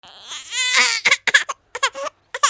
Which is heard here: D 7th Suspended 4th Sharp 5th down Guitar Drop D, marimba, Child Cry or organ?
Child Cry